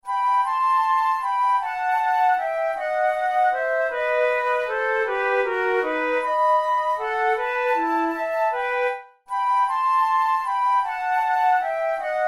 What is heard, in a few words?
duet in e minor; kohler op93 no1 duet no5